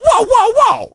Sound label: crow get hit